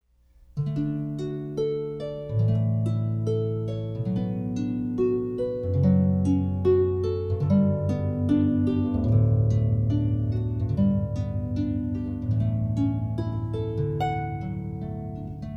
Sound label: Harpist, Pachelbels Canon; San Francisco